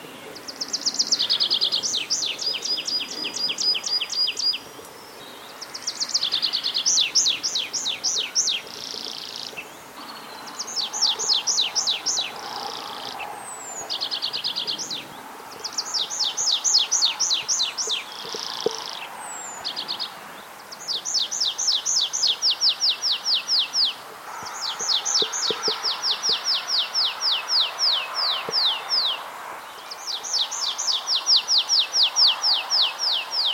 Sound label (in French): pipit arbres, le printemps se vit dans la nature